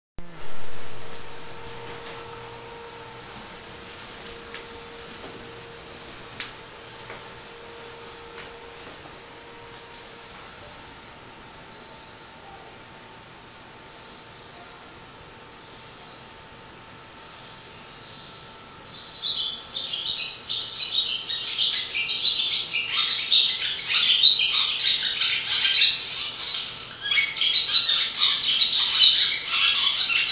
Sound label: swallow